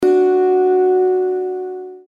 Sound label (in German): Zithermacher Ton D LAUTER